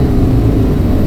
second shift down, car; vehicles; garrysmod; sincar

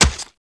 knife hit, weapons; garrysmod

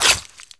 knife hit, weapons; garrysmod

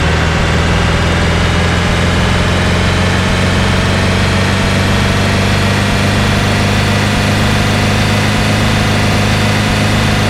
2nd gear, vehicles truck; garrysmod

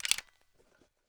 m3s90 load; garrysmod; m3s90p

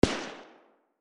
famas, distant sound; garrysmod

